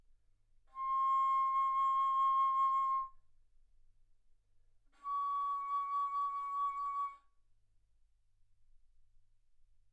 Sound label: Bass Flute ff C6 Db6